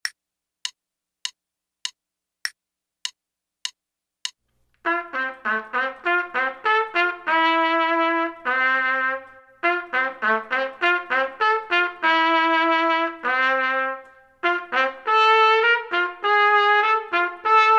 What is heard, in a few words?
trumpet; Selected Duets for Trumpet Podcast